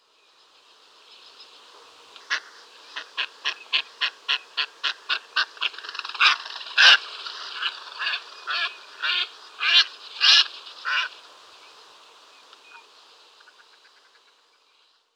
Mallard, Bird Calls

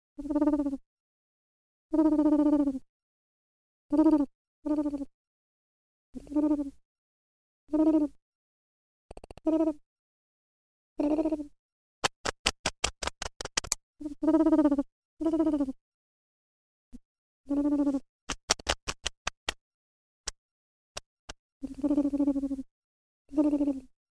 Pigeon, Bird Calls

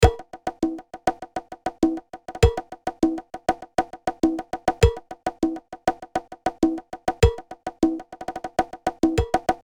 conga

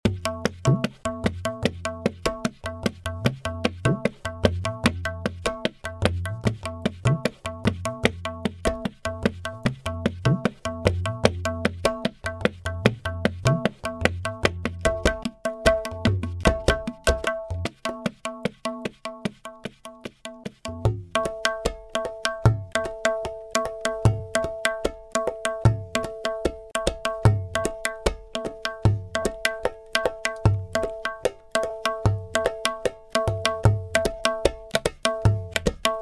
tablas

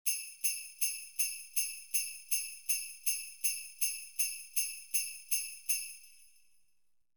tambourine

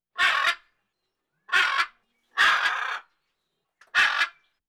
Macaw, Bird Calls

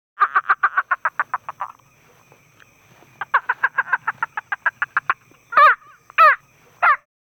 Toucan, Bird Calls

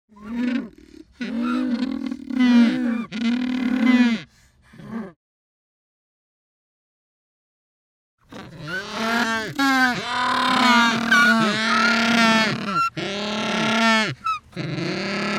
Humboldt Penguins, Bird Calls

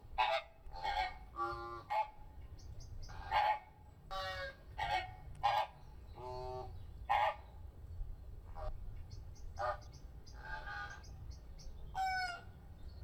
Flamingo, Bird Calls

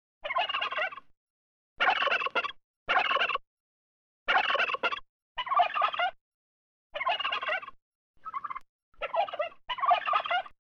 Turkey; BirdCalls

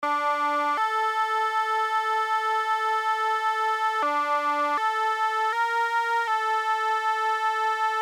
bagpipe

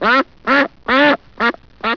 DUCK

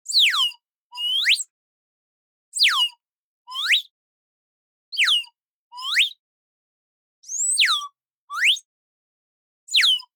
Swan; BirdCalls

